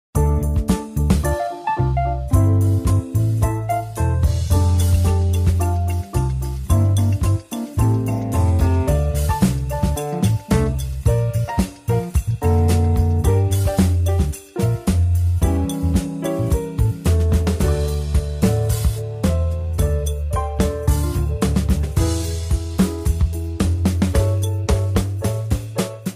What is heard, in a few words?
jingle